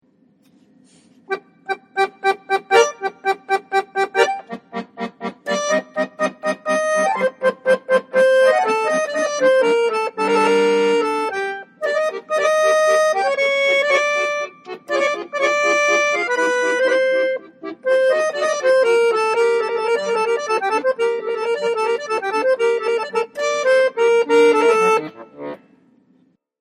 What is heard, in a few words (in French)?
la poule, akko